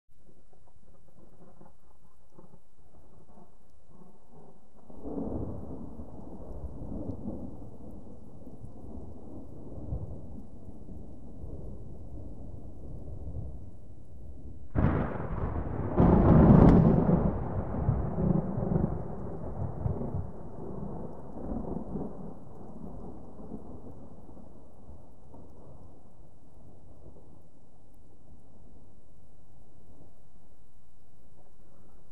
Thunder; Medium Distant Rumbles & Close Explosive Strike with Light Rain